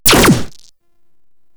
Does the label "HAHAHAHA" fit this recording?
No